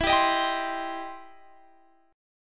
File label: C Minor E; strummed; Cm/E